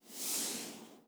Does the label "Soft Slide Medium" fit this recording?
Yes